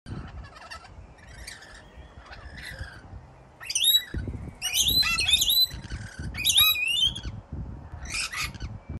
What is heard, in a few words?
Rainbow lorikeet